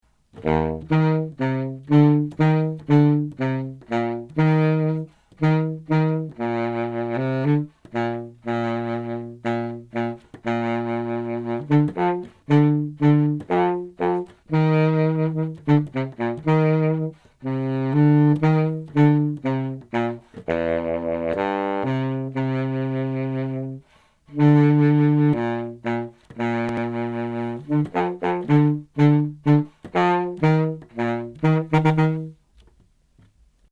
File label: bari etude